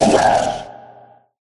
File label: cry